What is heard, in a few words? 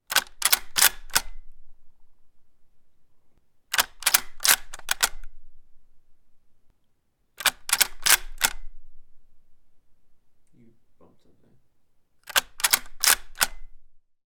weapon gun 1917; still north media